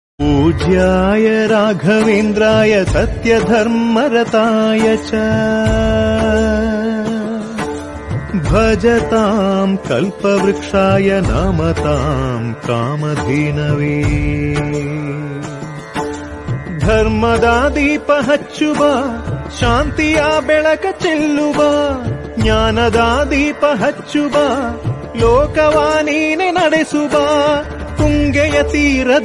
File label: kannada